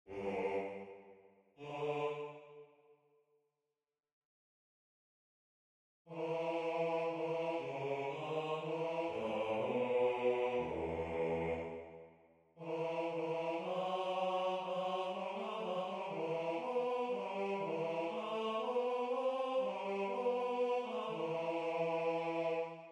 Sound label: Basse; Dietrich Buxtehude; Weg mit allen Schätzen